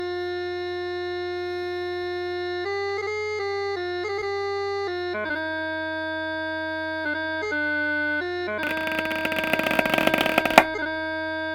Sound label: Snare Chanter